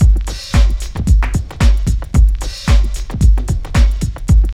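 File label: drum loop; X-Static Goldmine 3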